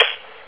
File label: beat cut